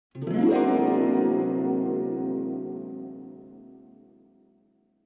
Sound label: harp gliss